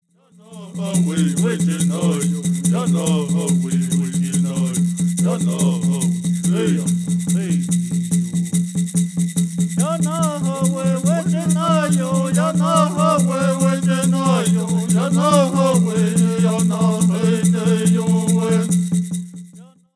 Peyote Song

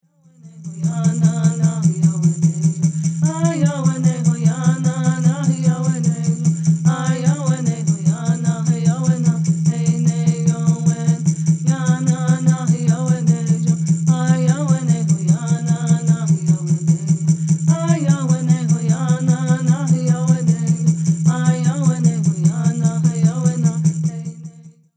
Peyote Song

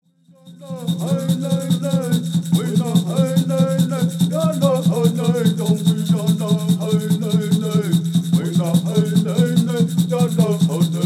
Peyote Song

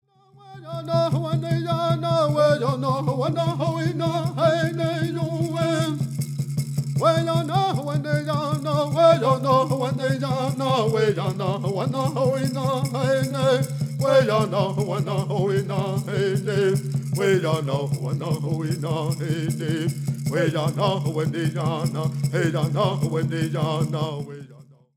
Prayer Song

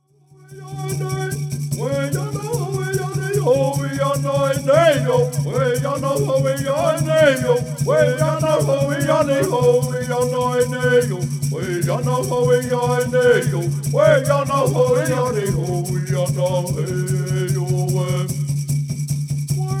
Peyote Healing Song

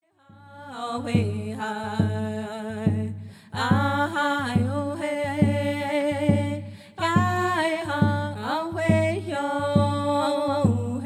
Straight Round Dance

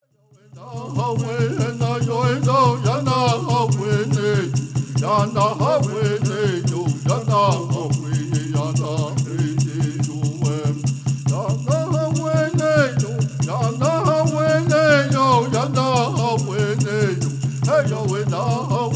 Peyote Song

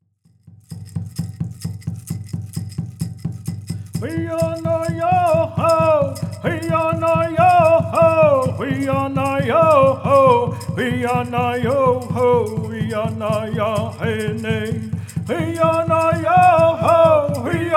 Apache Peyote Song

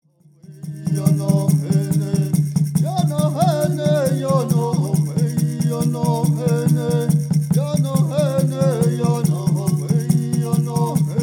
Straight Song

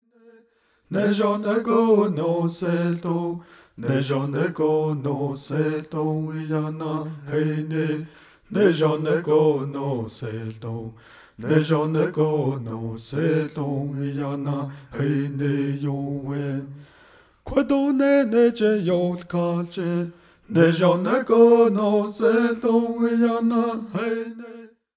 Dineh Prayer Song

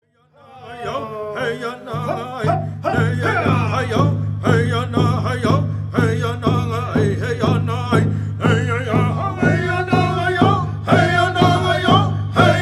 Apache Blessing Song

